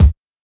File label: dope bass drum d sharp key; kick drum samples; one shots